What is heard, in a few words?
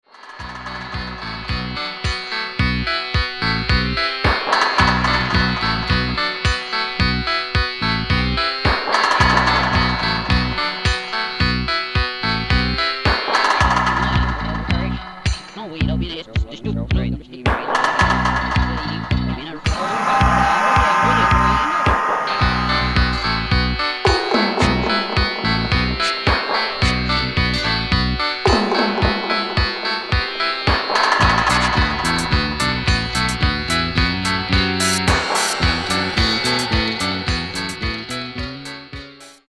China Beat